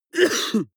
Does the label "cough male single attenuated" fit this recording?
Yes